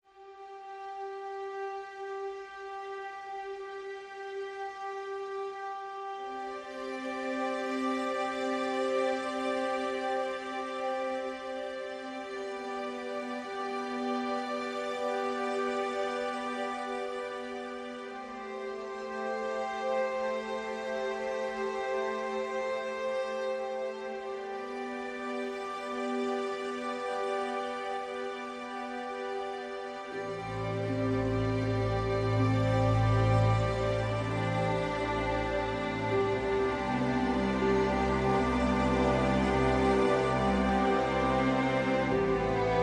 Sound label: Soothing Waves Strings Only